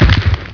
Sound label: pitfall; mk2